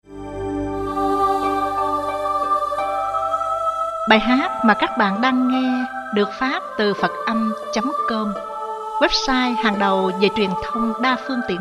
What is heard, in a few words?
buddhist monk drumming; Phat Am